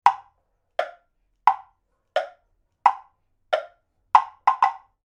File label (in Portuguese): BLOCOS SONOROS